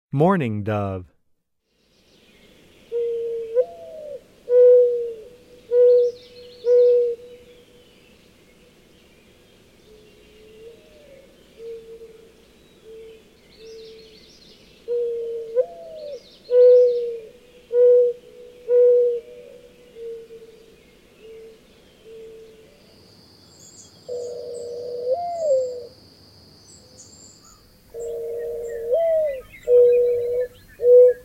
Mourning Dove, Wildlife Sounds

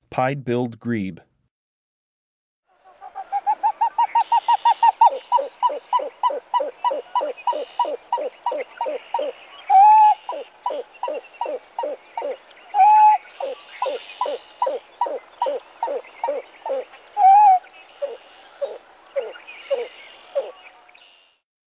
Pied-billed Grebe; Breeding Birds of the Chicago Region

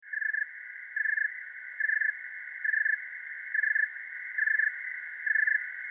Oecanthus fultoni cold; Arthropoda

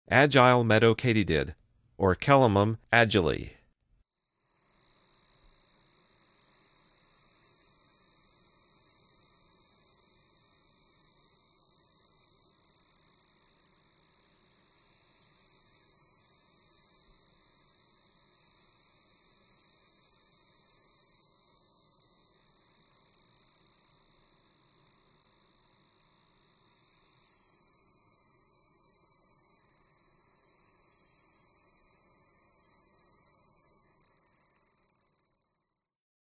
Agile Meadow Katydid; The Songs of Insects

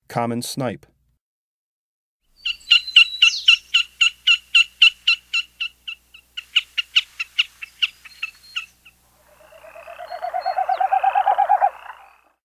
Common Snipe, Birds of the Chicago Region

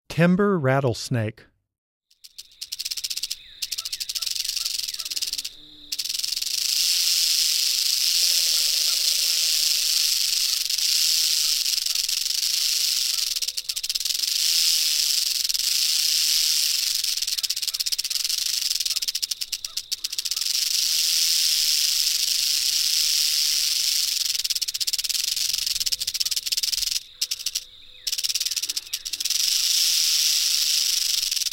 Timber Rattlesnake, Wildlife Sounds

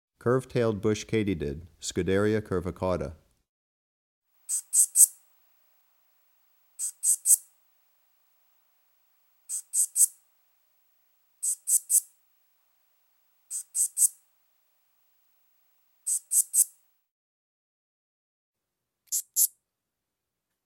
Curvetailed Bush Katydid, Scudderia curvicauda; Crickets and Katydids

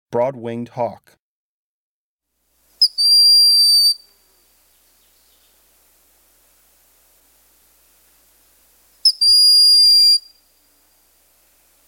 Broad-winged Hawk, Birds of the Chicago Region

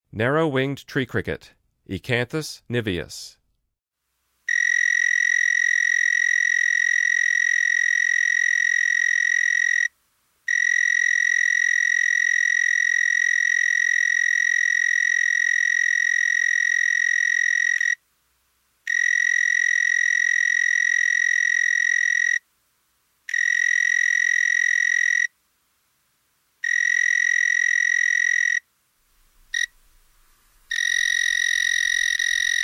Narrow-winged Tree Cricket; The Songs of Insects

